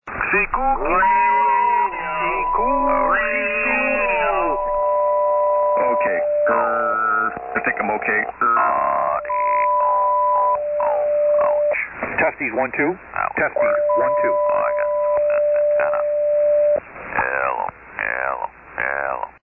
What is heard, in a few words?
SSB Net Tune Up